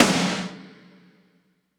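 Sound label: Snare Drum Slam Verb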